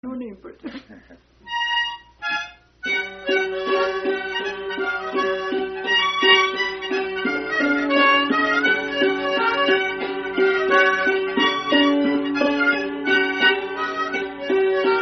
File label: Hornpipe